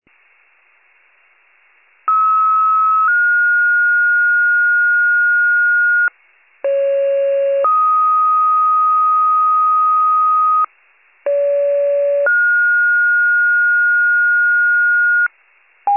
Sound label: FIRE pager alert